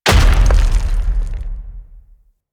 Thumper Land Heavy C; Warframe; Grineer

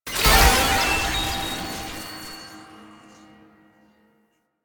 Friendly Use Boost; Warframe; Nineteeen Ninety Nine; PvPvE

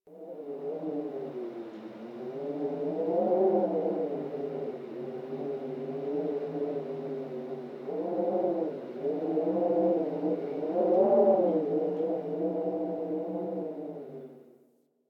Landscape Cave Wind Howl, Ambience

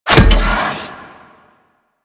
RPG Knockback Default Impact; Hellion Elite

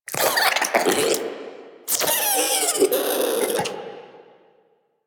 Cryptilex Vocal Tranquilized; Warframe; Hunting Critters

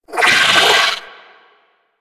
Amalgam Moa Satyr Vocal Pain; Warframe

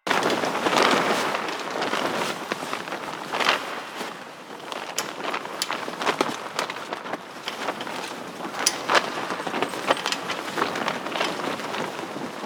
Tarp Rustle Loop; Ambience; Props; Warframe; Nineteen Ninety Nine

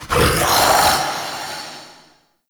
Male Vocal Attack; Enemy; Mockeries; Mockery

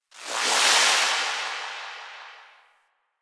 Zariman Power Rift Buff; Warframe

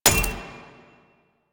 Sticky Bomb Proj Land; Grineer; Veilbreaker; Vor

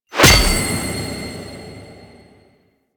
UI Socket Filled B; DE; Warframe; Fusex Treasures

